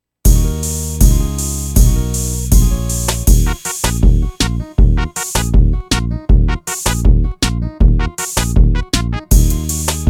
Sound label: reggaetone